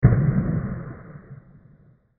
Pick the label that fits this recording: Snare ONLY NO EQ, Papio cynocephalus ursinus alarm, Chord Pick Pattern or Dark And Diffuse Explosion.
Dark And Diffuse Explosion